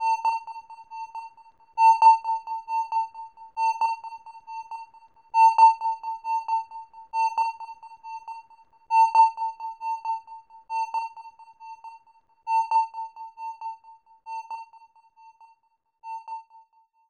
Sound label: Pass screen bleep; buzz brain of the world; buzz svenska genier; buzz suomen neropatti; sweden finland psp gamerip 2009